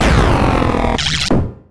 death; quake2; fuel

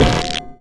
fall; quake2; fuel

